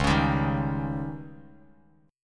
Db Suspended 2nd Flat 5th, Bass Standard; strummed

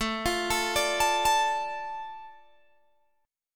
A Suspended 4th Sharp 5th, Guitar Open G

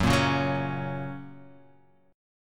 F sharp Major; strummed; JGuitar

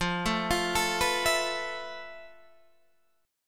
F Major Sharp 11th, Guitar, Standard; arpeggiated; JGuitar

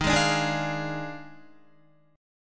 Eb Major 7th Suspended 2nd Suspended 4th, Guitar; strummed; chord; JGuitar

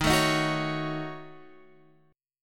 F sharp Minor 6th, D sharp, guitar chord; strummed

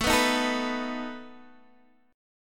A Suspended 2nd Flat 5th A down Guitar Open D

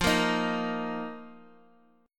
F 7th Suspended 4th F down

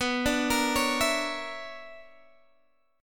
B-Minor Major 11th B down Guitar Drop D

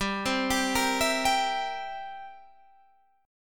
C 7th G

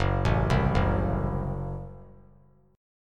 Gb Diminished; arpeggiated; JGuitar

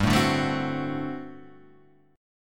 G Major 7th Suspended 2nd Suspended 4th G; strummed

